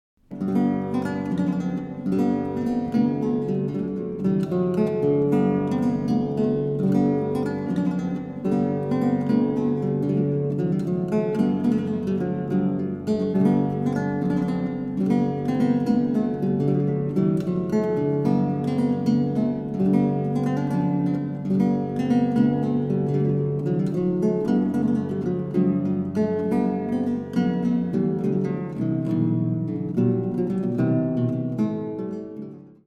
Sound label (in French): Suite pour theorbe en Sol Maj Musette; X.Diaz-Latorre; Robert de Visee